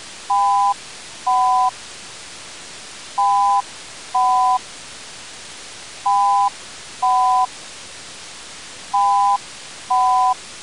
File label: Shepard scale; A pair of complex tones is played four times against a background of noise.; Pitch Paradox